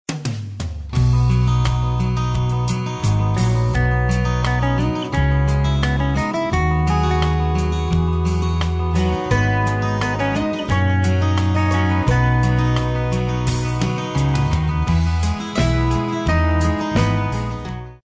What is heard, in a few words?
country